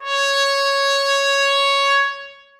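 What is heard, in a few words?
trombones sustain c#5; Sonatina Symphonic Orchestra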